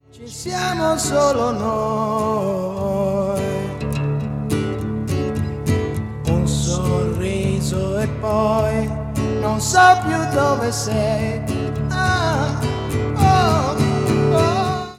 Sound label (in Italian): Provino